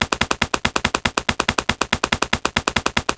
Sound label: STAMPER, MACHINES